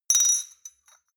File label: shot glass clink hard, gastronomie; berufe; soundpool